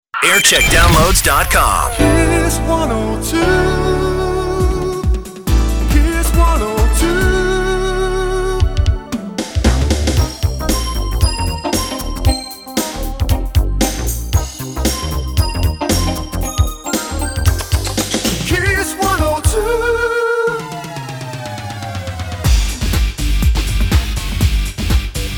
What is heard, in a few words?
jingle montage